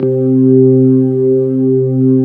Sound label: B3 HAM, Keyboards Old School